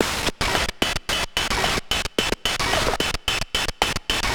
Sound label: Bent Korg MR-16 (110BPM), cyborg beats